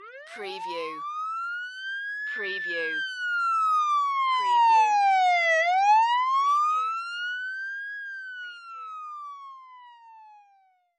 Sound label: Police Siren Pass By, Emergency Vehicles; vehicle transport sounds; Sound Effects Bible